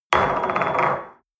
espresso place on table hard wobble, restaurant cafe